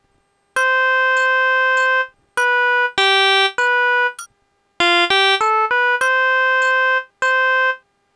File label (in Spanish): ejemplo compas 2/4; compás 3/4; Educacion musical